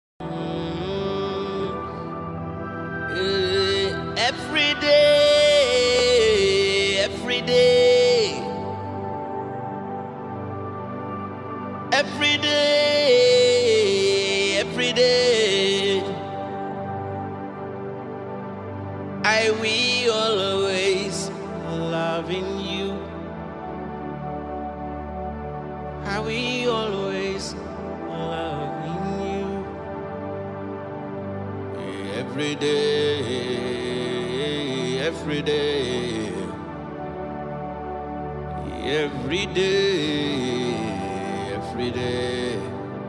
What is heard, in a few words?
Everyday Prayer Chant Worship Ambience